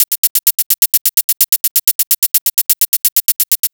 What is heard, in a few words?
hi-hat; Sonic Foundry (Sony Creative Software); Afterhours EDM; Retro Fit